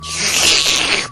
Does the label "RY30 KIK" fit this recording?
No